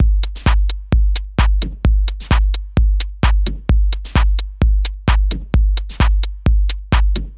130 Bpm Minimal Beat

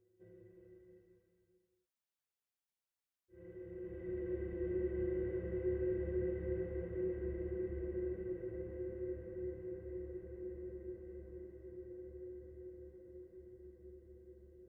Surround Dronetail

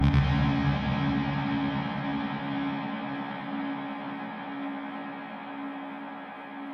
Hit

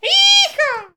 Cartoon Iha

